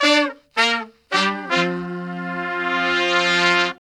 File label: BRAZ LICK, BRASS LICKS